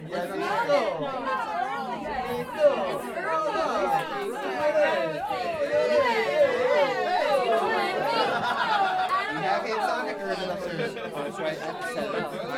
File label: Rowdy Crowd